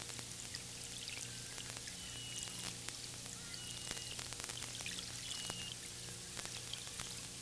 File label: beluga; arctic; healy; arctic edge